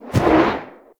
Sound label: Hazard Jump; Ember Prime Boss; Warframes